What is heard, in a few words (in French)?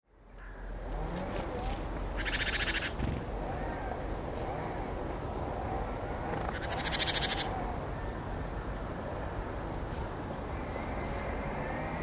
mangeoire oiseaux